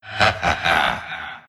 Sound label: laugh; Orac